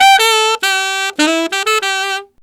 Tenor in C, Tenor Sax, Jazz; Sonic Foundry (Sony Creative Software); Jazz Solos and Sections; Tenor Loops

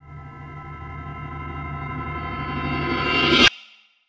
Bell Swell; KJ Sawka - Drum n Bass & Beyond; Swells

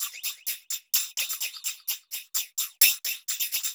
TAMB; Zero-G; Chemical Beats

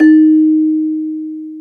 CELESTA D#4; AKAI S-Series CD-ROM Sound Library VOL-2

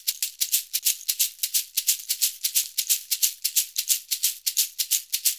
SHAKER, Modern Percussion Loops; USB Soundscan vol.56; AKAI

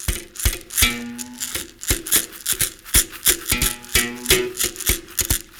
World Lick

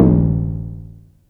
SYNCUSSION

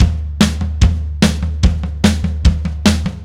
Beat, Indie Rock; Silos; Sonic Foundry (Sony Creative Software); Indie Rock Anthems; Session 11 - Silos

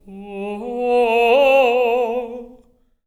LYRICAL; Spectrasonics; Symphony of Voices

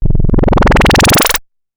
MS20 Fast Squelch

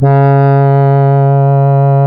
C#, Synclav FM, BRASS; Big Fish Audio

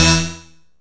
Synth Hit E; Zero-G Trance Inducer; 90 s Sample CDs

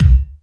BD, HOUSE

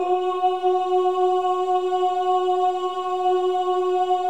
OH F#4, CHOIR OHS; Spectrasonics; Symphony of Voices

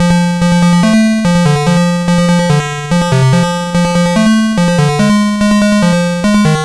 Game Boy Synth

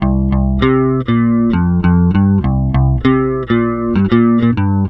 NUTS 98 C, Bass Grooves; USB Soundscan vol.30; AKAI

